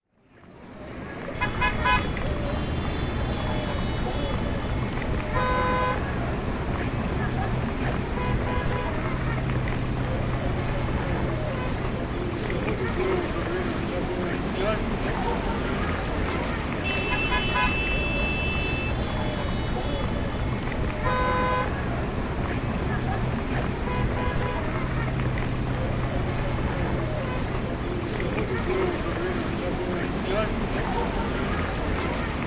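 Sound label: city street sounds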